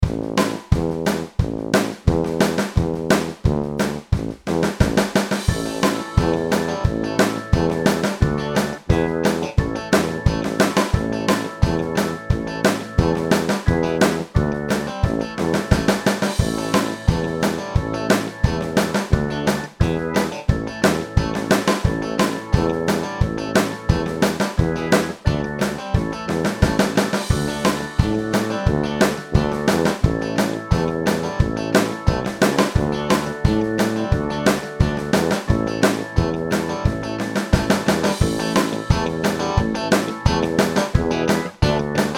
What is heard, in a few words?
Karaoke